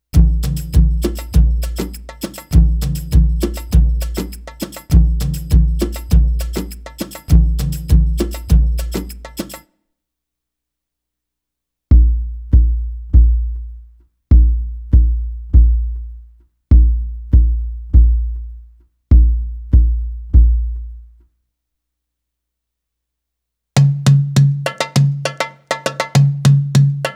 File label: Arabic Rhythms